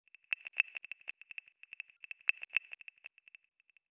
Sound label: Proximity Connection; Windows